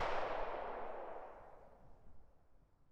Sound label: tail pistol shot, dry; weapons; Half Life Alyx